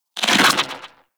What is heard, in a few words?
Infested Rifle Charged 2D Tail; Quanta; Weapons